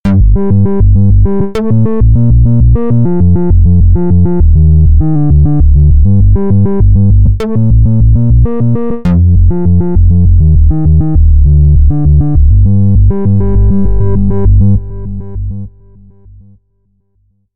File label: Syn Bass